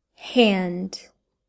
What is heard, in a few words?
hand